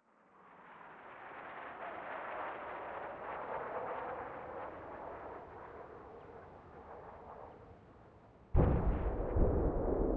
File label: combat atmosphere; World of Tanks